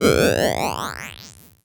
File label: Wipe Cartoon; Multimedia Internet CD-Rom Flash